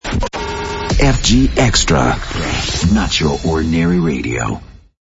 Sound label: jingle; fgxtra